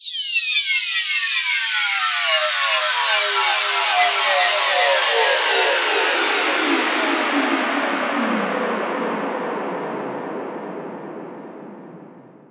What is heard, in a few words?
fx downlifter 150 howling; Virtual Riot; 8bar